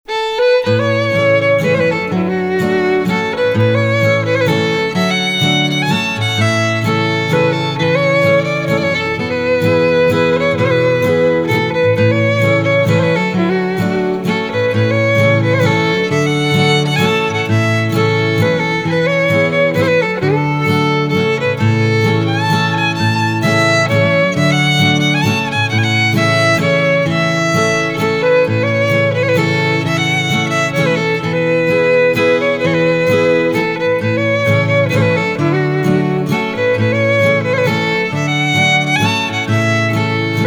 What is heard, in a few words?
Miss April's Waltz, field recordings, Mississippi music